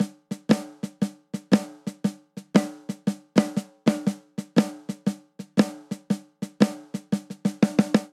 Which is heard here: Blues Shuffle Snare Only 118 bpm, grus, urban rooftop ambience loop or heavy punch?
Blues Shuffle Snare Only 118 bpm